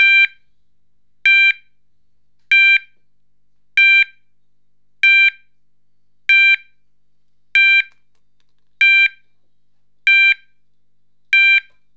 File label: Sounder